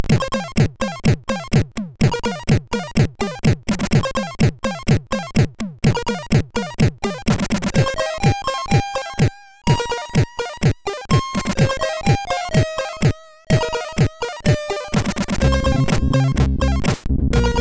Cool in Drums

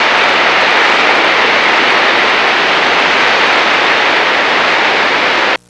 niagara falls; Sound Effects for Multimedia - Volume 1

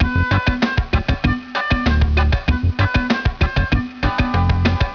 JANKEN LOOP; The Yakyuken 2

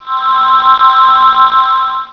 Level Rise; Ultima III; Ultima Sounds

